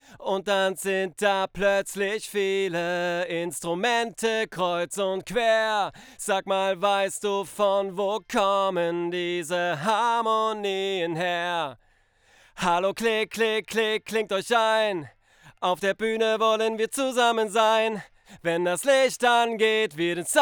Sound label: MALE VOX